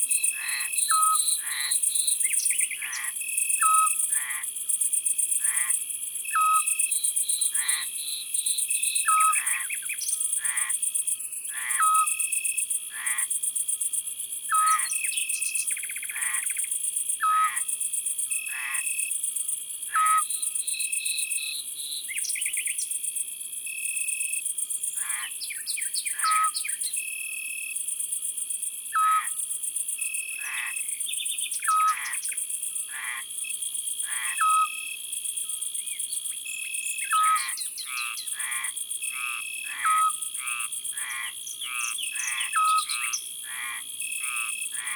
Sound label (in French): Hibou petit duc; ABC de la nature